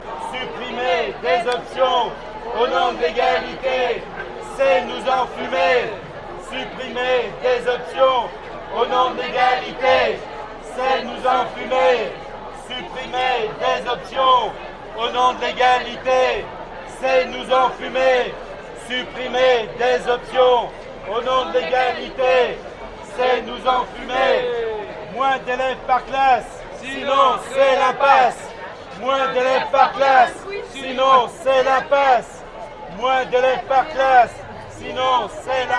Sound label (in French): Ambiance chants manif prof collège